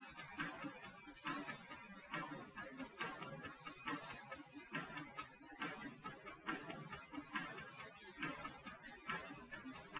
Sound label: test wdrums liverec 250ms 5cm src; SiSEC08; SiSEC underdetermined